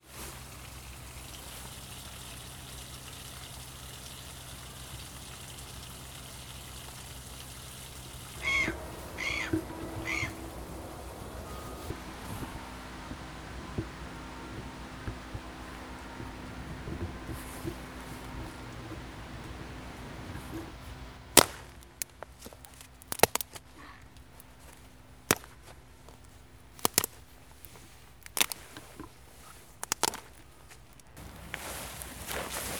calme

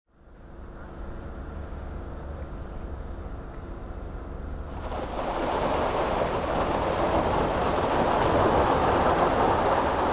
travaux au lycée; Dardilly

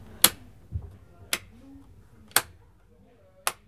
clic clic

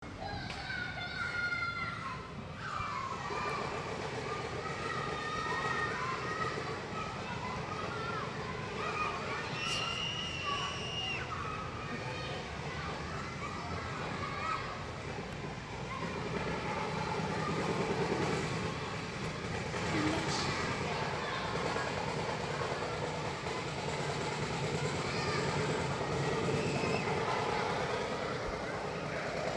en face de l'ecole

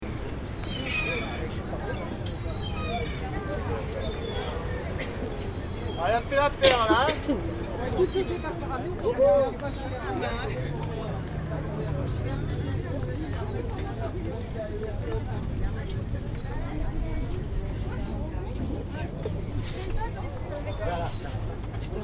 marche aux poissons

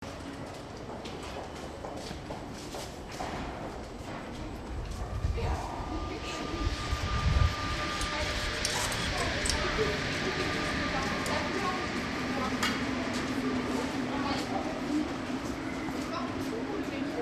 metro Guillotiere

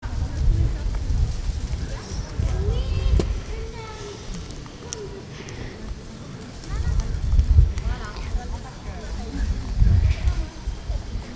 son d'ambiance

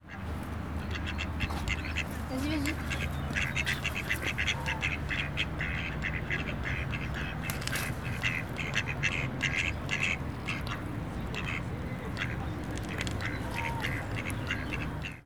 types oiseaux; sainte-foy

